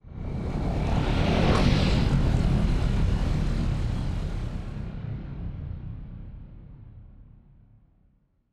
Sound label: ship colony ship move; Stellaris; machineage; ships